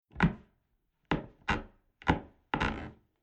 Household Old House Footsteps On Wooden Floorboards Multipule Creaky Squeaky Eerie; Creaky Door Sound Effects